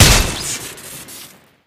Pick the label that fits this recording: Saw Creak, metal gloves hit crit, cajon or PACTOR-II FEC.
metal gloves hit crit